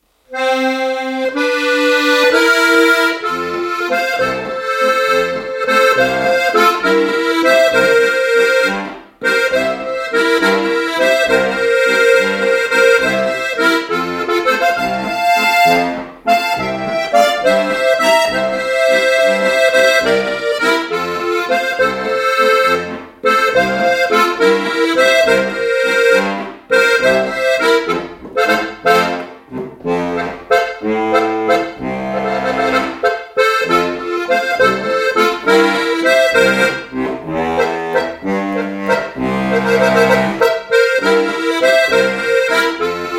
Walzer

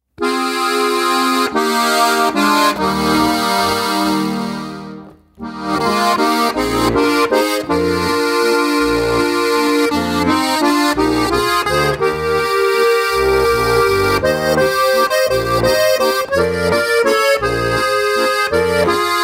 Walzer

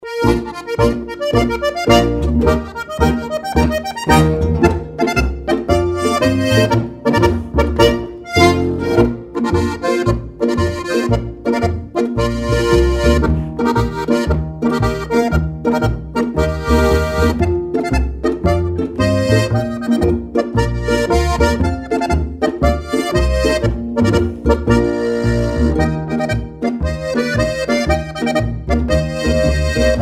Marsch

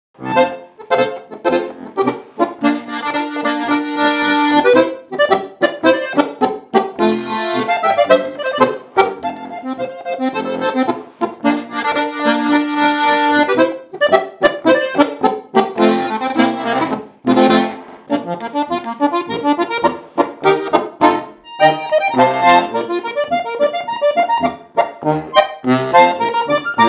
polka, Ziachorgl

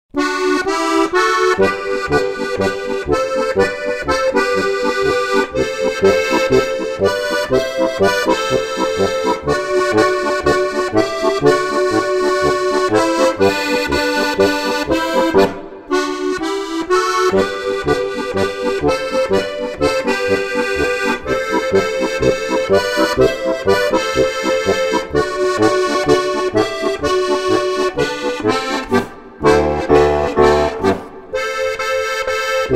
harmonika

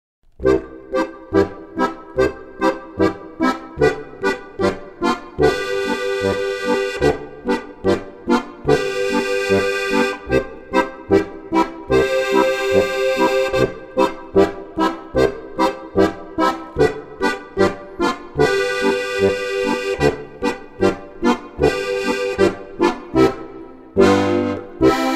harmonika

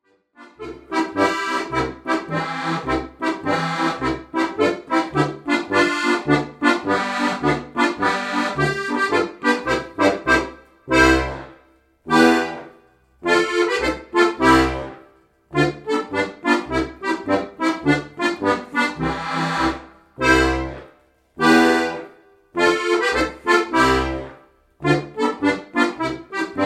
polka

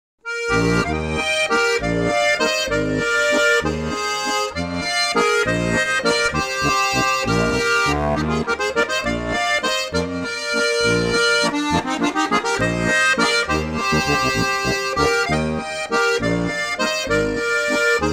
Walzer

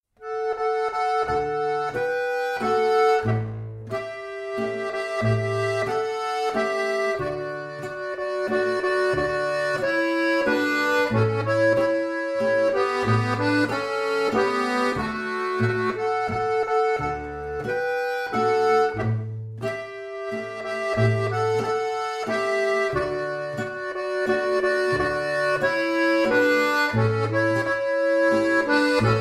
volksmusikstueckl

